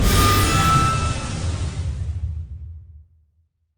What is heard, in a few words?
summon